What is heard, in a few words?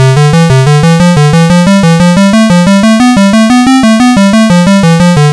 intervals; music